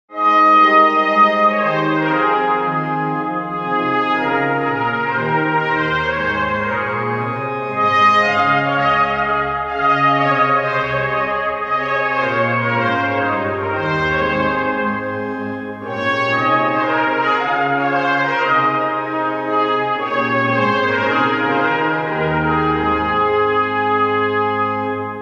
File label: trumpet voluntary